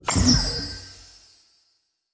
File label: fantasy game sweep notification; mixkit